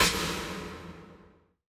Tails, Drum Bits, Verb Tails

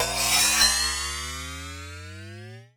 Cymbal; musicradar; cartoon caper

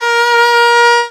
VIOL B3, VIOLON, Arabian Traditions; USB Soundscan vol.43; AKAI

